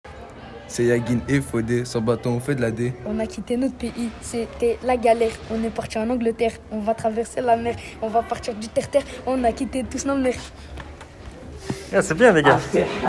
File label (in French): rap